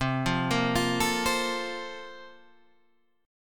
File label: Bb-Major 7th Suspended 2nd